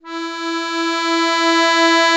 AKK VIOLISW, akkordeon; Best Service; Alpine Volksmusik

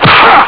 schlag normal; Amiga Soundtracker sample

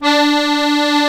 DIATONISCH, AKKORDEON, Alpine Volksmusik; Best Service

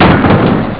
krach; ST-XX Amiga Soundtracker Sample Packs

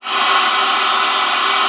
LUFT, OB D.AMORE; Xsample VOL-7

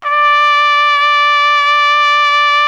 TROMPETE D 3, TRUMPET; Best Service; World Colours

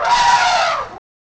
Elefant; Crypton - DrumBase5000; AFRICNRHYTHM

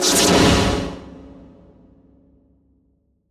RAKETE; Best Service; Advanced Mega Effects

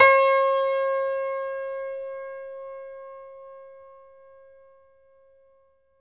HACKBRETT C#3; Best Service; Ethnic Journey Sound Cube

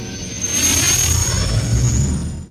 DUESEN; Best Service; Advanced Mega Effects

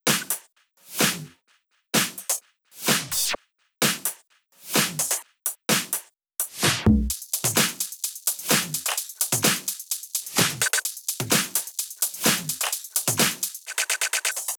Drum Loop 128BPM FULL ohne BD

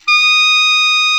KLARINET F 4, CLARINET

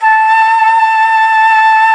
FLOTE SOFT

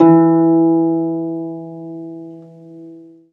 HARFE E0; Best Service

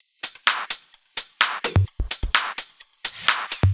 Drumloops Beat 128 BPM ohne BD; Vengeance - Essential Clubsounds Vol.5; VEC5 Drumloops; VEC5 Multi Loops 128BPM

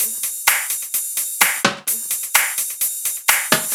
Drum Loop 128BPM FULL ohne BD

